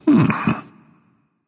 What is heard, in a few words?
thinking, voice; Smite; ymir skin14